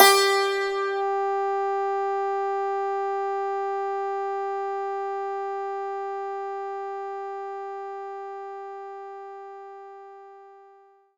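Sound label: Dulcimer C#4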